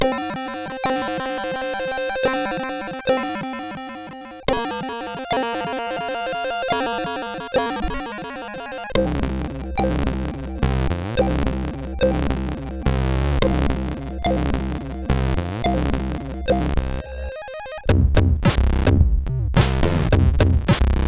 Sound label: C64 sid CSG8580R5; Rescue; Scene Drama